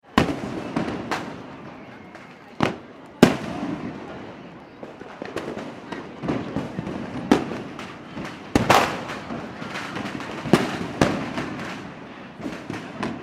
fireworks mixture urban, Fireworks (Large And Small) In City/Town; Fireworks In City / Town - Mixture Of Large And Small Fireworks Close By And In Distance; Chinese New Year